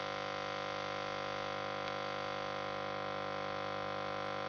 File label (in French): ronflette